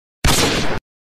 mouse click; mlg; audio meme

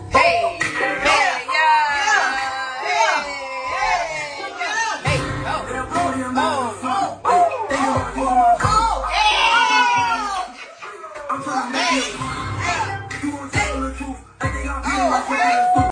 kid dancing; audio meme

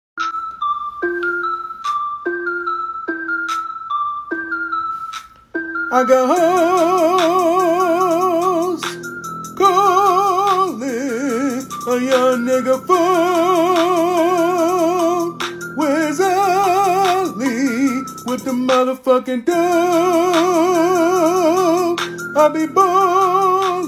singing; audio meme

